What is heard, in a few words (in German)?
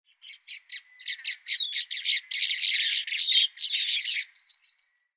Blutschnabelweber Quelea quelea; Vogelstimmen